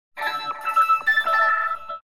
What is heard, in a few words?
Super Jump landing marker audio; Splatoon 2 LINE sticker; Where u at?